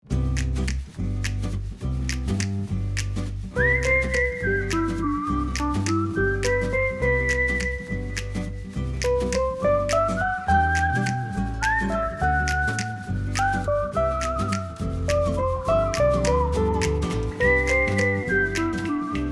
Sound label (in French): non non jazz